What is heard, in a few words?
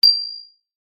impacts metal ping high pitched small